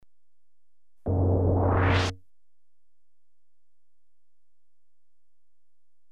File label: Sliding door (rapid with mechanism hum), Electronically Generated Sounds; Sound Effects Library